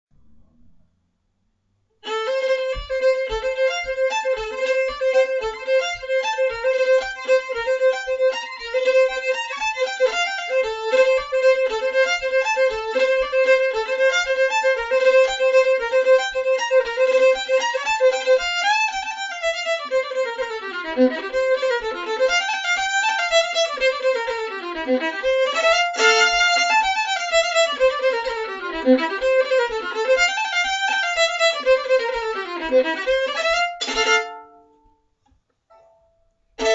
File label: japanese reel